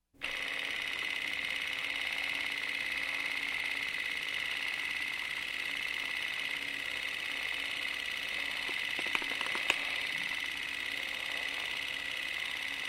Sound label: Movie Camera Old Clockwork Type